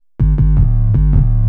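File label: synth bass, drum and bass, 160 bpm, C; eJay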